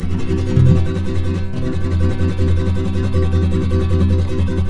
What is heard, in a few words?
Guitars, FLAM TREM; Spectrasonics; Hans Zimmer Guitars VOL-2; FLAM TREM CH